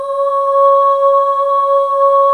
AH DB4, CHOIR

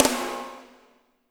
SNR; Spectrasonics; Burning Grooves

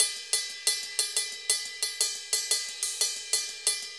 Ride, Festa Latina, Drums & Percussion; Best Service; Festa Latina VOL-1

